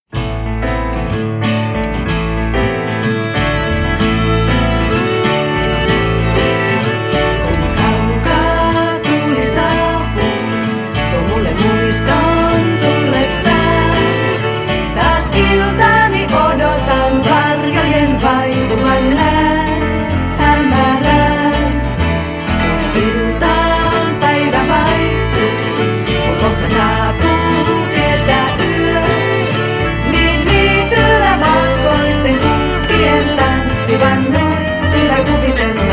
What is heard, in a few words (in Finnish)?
Tanssilaulu